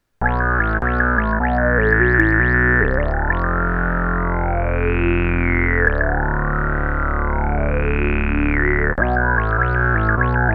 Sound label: E-mu Liquid Bass